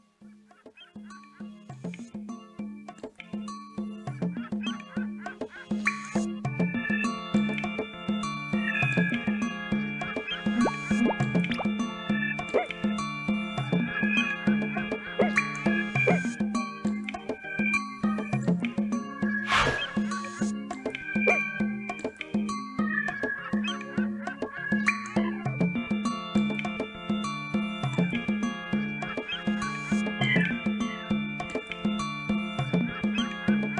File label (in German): musik; battleblock theater